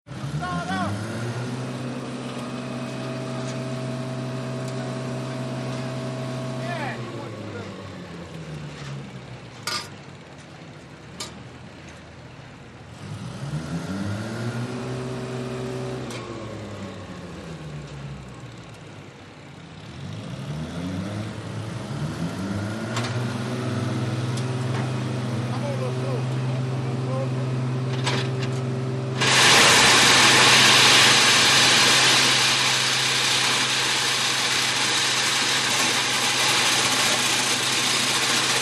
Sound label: Rattling Machine BG, construction large